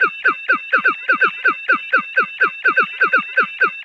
Wacko Birds; Sound FX

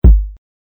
kick

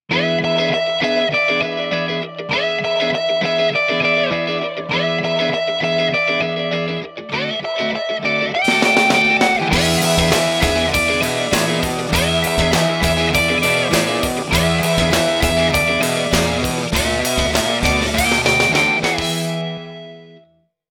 NOKIA ROCK, ringtone; Roadster

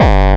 bombo, kicks, Hardcore

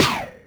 snaredrum, Yamaha RM 50

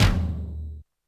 Yamaha RM 50 Toms

